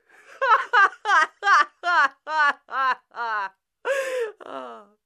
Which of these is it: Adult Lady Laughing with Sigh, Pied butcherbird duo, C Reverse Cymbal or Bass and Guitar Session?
Adult Lady Laughing with Sigh